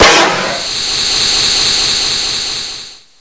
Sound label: GAS FLOW, weapons